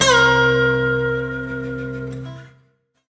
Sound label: small Win Riff; dead or alive 2